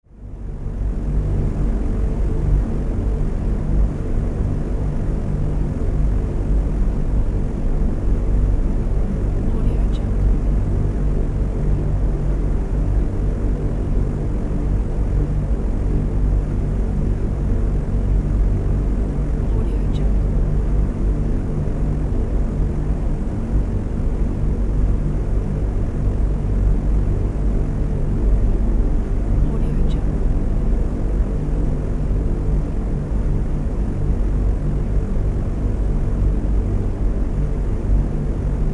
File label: wwi and wwii bombers flying; bbrealsound